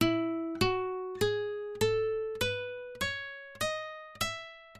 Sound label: E Major Scale